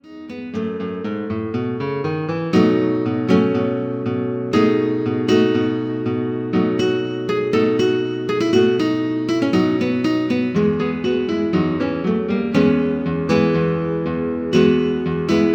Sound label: synth